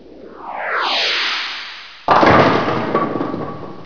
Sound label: meteor storm